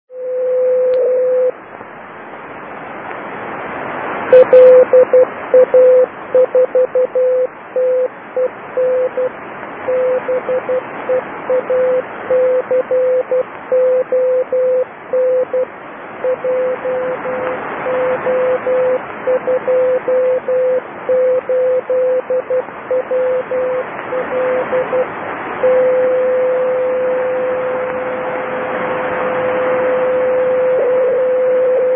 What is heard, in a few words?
beacon; LA4TEN; Doucy